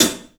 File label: HAT, CLUBHAT, 3D Drums; 3D Sound